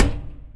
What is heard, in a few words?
impact Plate heavy; Kenney's Sound Pack; Impact Sounds